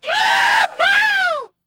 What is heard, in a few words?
kid fall; monsterplex